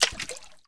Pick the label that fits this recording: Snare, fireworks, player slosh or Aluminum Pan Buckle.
player slosh